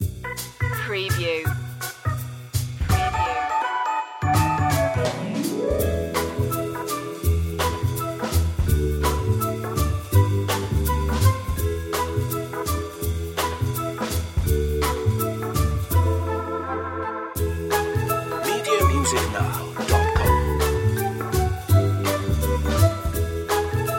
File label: retro showroom